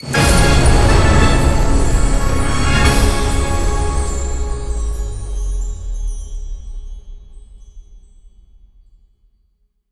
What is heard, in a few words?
super Mega Win End; netent